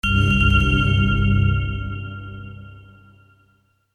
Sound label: Bells; sfx alerts